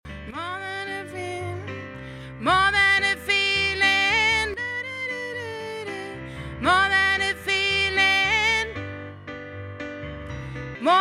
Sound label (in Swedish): sopran